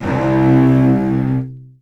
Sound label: Virtuoso Strings, TRM FX; ILIO; Kirk Hunter Virtuoso Strings VOL-2; 90 s Sample CDs; 10CL TRM FX